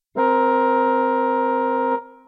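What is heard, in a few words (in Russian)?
орган интервал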